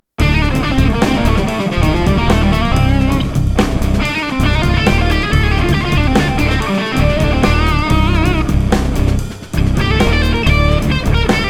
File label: phrygian